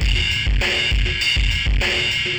ind dist ride

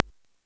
click

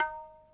hi hit, tabla; Dirt-Samples

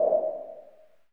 wind

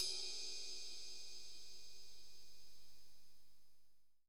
ride; Super-Dirt project

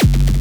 snarl

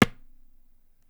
tabla; Dirt-Samples

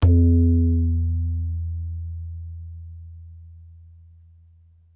tabla; bahia; Dirt-Samples

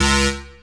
stab; Dirt-Samples

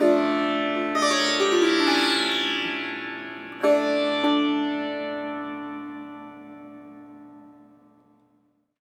d minor sitar chord

